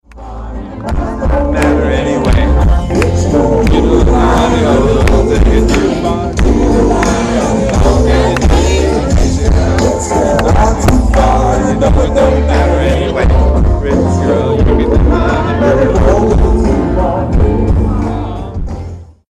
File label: singing off key and wrong words